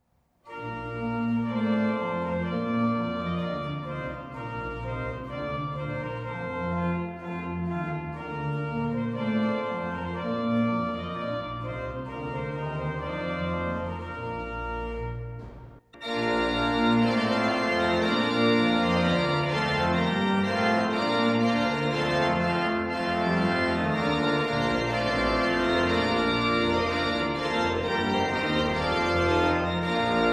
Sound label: trumpet